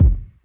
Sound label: Kick; Resan; Red; RnB